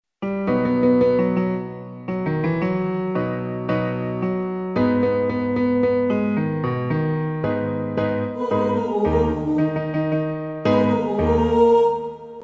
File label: Unison; utopia; tutti; A Company Limited